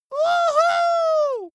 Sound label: dwarf cheer "wuhu"